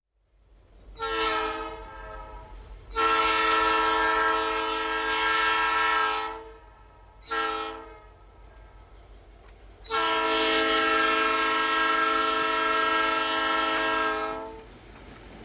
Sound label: Train By Slow whis, bys